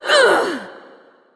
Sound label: Wound Crit; Creature; Oz